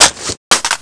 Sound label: clip out, weapons m16; m16dmr